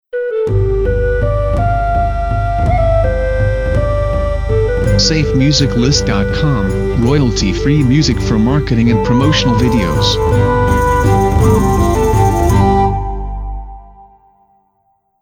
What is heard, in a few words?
Celtic Video Intro; SafeMusicList